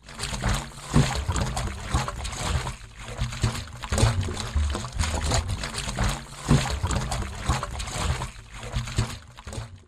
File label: slime